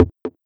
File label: Knocker, techno drum fx; Single Hits